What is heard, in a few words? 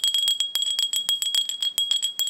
bell